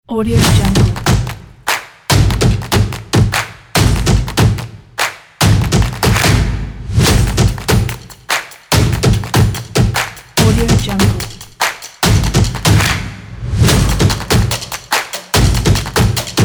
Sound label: percussion stomps energetic claps